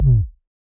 Ball Collision Forcefield Light

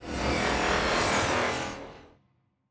Skybox Scan Beam Entry

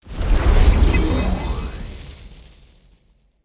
Reward Shower Hypercubes

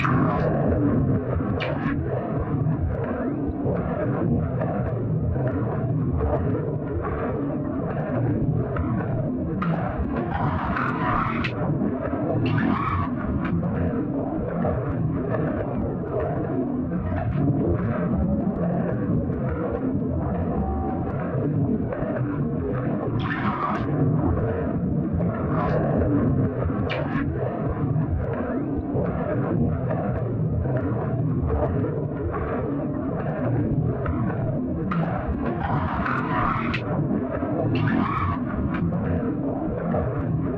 Player Body Sliding Loop

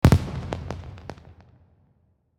Celebration Fireworks

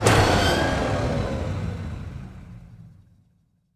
Fan Deactivate; Scraprun; Deathrun

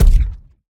Ball Player Hand Hit Extra Hard

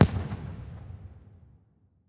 Fireworks

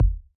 Ball Collision Geo Tackleball Extra Light

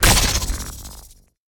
Hit Other Sword

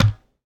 Tennis Racket Ball Hit Med

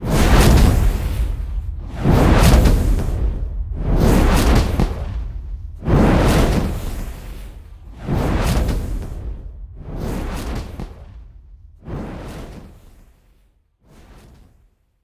Goal Scoring Animation

